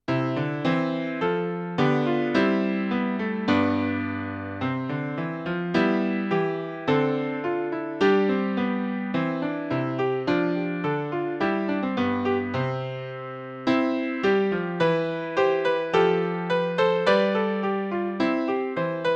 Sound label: Accomp; Music Alone Shall Live